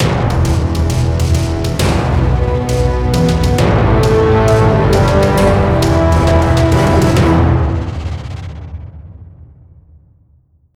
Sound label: Music Stings